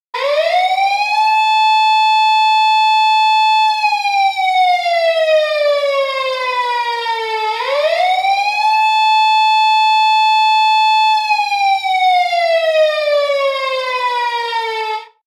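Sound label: police car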